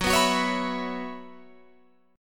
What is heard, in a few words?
Gb 7th Suspended 4th, Guitar Open F; Gb7sus4 strummed